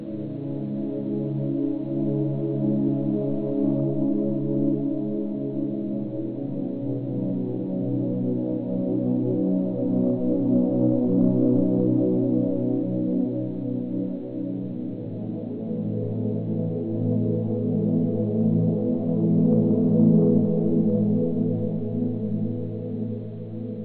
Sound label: pad riff; Monolith Jungle